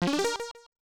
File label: Logo Jingle; super tetris 2 bombliss; pc 9801